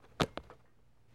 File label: Football Handling